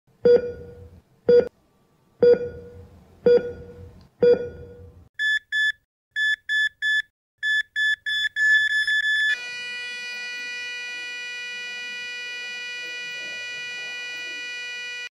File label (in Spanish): Monitor de Latidos